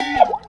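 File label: WASHEE BEL, percussion metallic; Rarefaction; Poke in The Ear With A Sharp Stick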